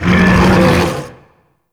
Charge Scream; Warframe

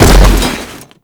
Bodyfall; Warframe; Tenno; Foley

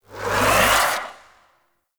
Amplifier Chassis Charge Up; Weapons; Operators Amp Chassis Part A Charge Up A; Warframe; Amplifiers

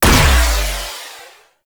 Burst Missile Launcher Fire; Rail Jack; Warframe; Vehicles; Rail Jack Weapons

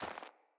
Sandals Run Snow, Footsteps

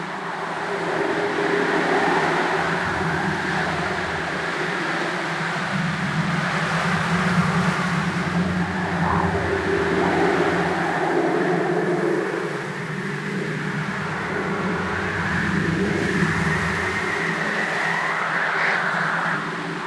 Settlement Crevice Ambience; Warframe; Grineer Settlement; Interior

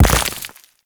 Mech Step Surface Dirt; Vehicles; Step Surfaces; Warframe; Nechro Mech

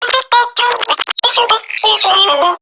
Garden Drone Vocal

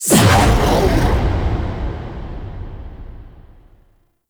Fight Stage End Stinger; Warframe; Duviri; Kullervo Encounter

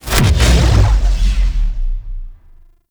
Burst; Hunhow; Warframe; Bard Quest; Bard Boss Fight Notes

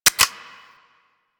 UMP Mech; Warframe; Weapons; Corpus

